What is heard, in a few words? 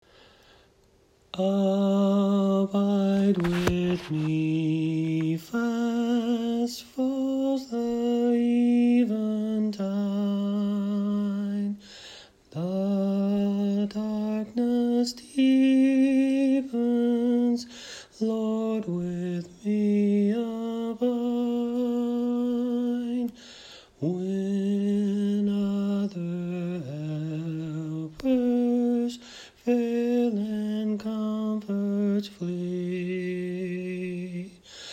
Eb SOPRANO